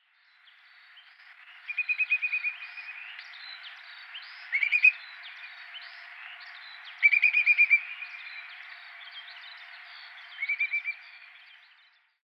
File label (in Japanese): chushaku, tatsuno, koe